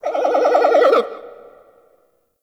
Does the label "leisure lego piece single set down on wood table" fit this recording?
No